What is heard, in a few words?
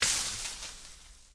wall brush; Golden Crayon Sounds